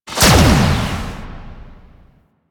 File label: Chain Reaction Impact; Warframe; Warframes; Qorvex